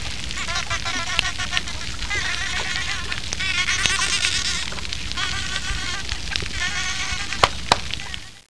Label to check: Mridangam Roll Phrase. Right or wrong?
Wrong